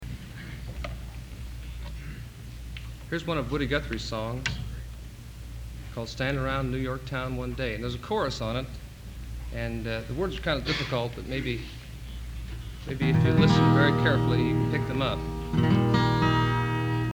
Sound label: Applause